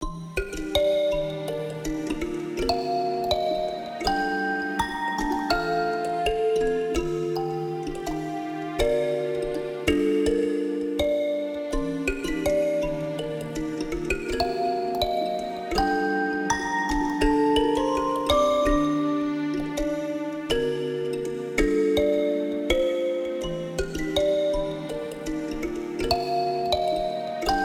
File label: Kalimba